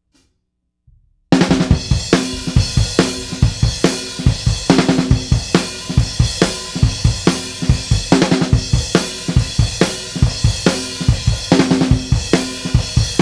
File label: drum submix